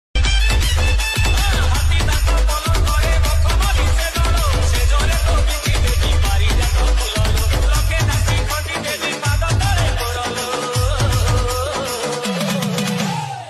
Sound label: odia dj song